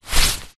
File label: raincoat sleeve arm movement walking single; foley